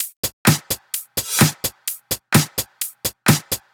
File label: House loops 128BPM; Vengeance Samples